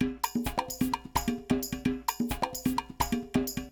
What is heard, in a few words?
Loop Percussion 130